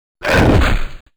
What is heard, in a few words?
attack